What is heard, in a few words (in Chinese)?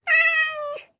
猫流浪者待机声